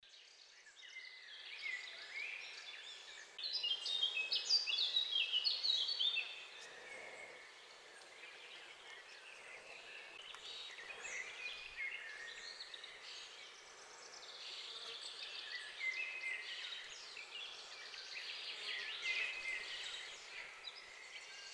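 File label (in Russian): beautiful forest atmosphere with an insect flying past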